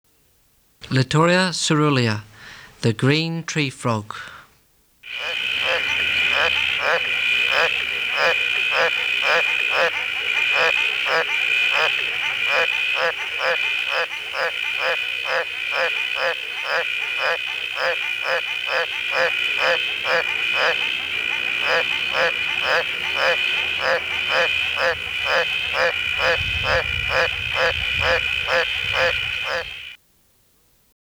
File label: Litoria caerulea